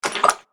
slime; creature

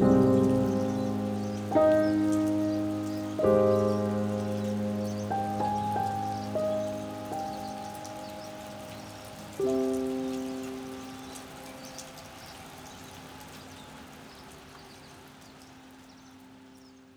Piano Outro; BB 2HTC Samples Vol 4; Solos

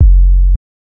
Bounce Up; FREE SFX PACK VOL 3

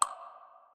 weird, sfx

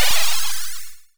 magic

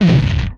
Explosion; MMRetroArcadeSoundsPack1 0 5

